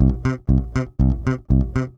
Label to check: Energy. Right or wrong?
Wrong